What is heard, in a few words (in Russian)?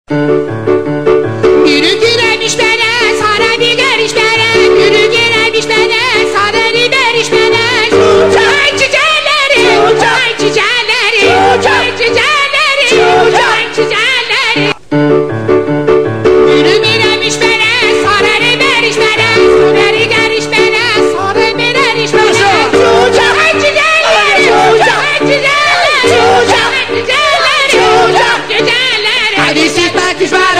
uzbekskaya narodnaya; uzbekskijtanec